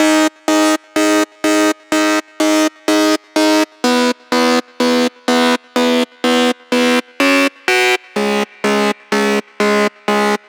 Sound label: Electric Fifth